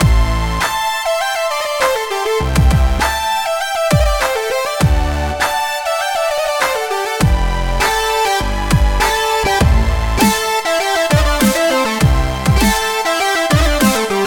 Break Loop

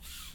Rope Sliding

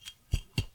Holding A Glass Bottle

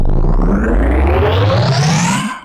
Power On

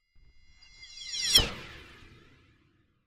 Trailer Hit

